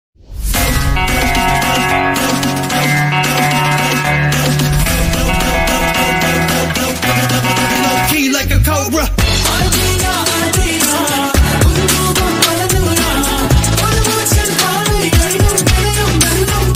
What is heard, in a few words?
tamil song ringtone, trap; cobra